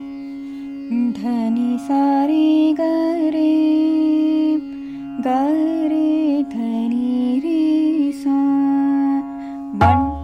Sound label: Raga Kafi